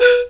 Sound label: PIPE B 3, REED PIPE, Africa; Spectrasonics; Heart of Africa 1